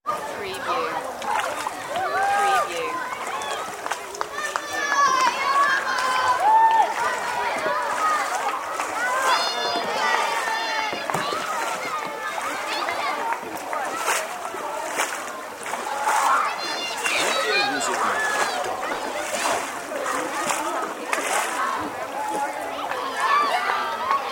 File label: Lake Summer Camp